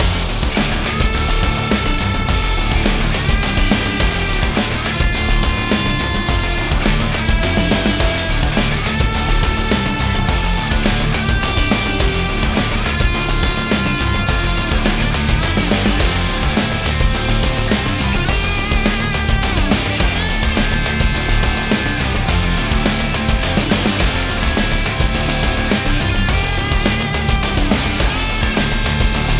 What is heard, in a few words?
BGM Kowloon Park